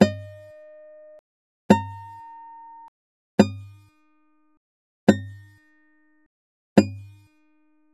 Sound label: Synthesized Tone Direct; flageolets